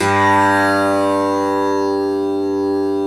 SITAR G2, Indian Ensemble

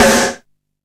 Snare, Drum & Bass; Claps; AMG - Prototype Drum & Bass; Snares

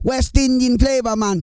REGGAE, Vocal Planet World Voices

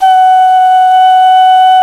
D OCRNA, CLAYFLUTE; Africa; Spectrasonics; Heart of Africa 1

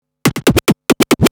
Scratch Fills 140BPM

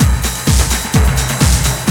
STREAM LOOP, BREAKBEATS

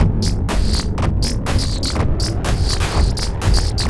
Trip Cloud, Noise Percussion, Ethereal Textures; Sonic Foundry (Sony Creative Software); Ma Ja Le

